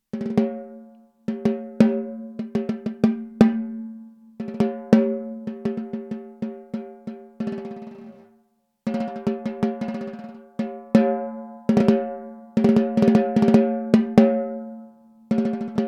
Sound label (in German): Tama LMB1465 mittel